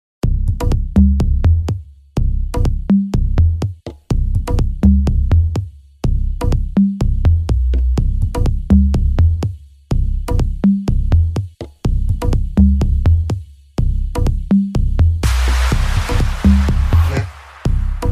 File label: arabic samsung s8 ringtone